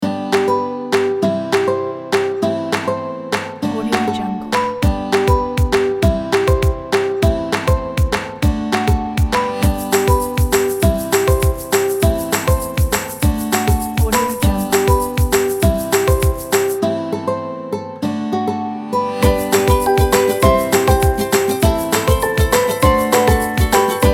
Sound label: the happy kids